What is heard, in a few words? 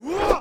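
weapon infantry spear; Battle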